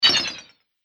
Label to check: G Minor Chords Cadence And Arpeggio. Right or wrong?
Wrong